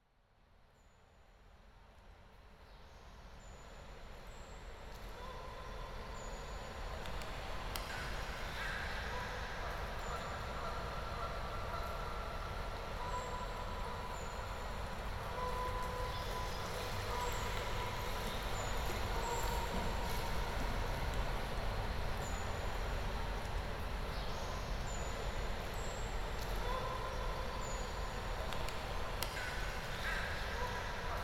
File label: Soundscape; Land Lab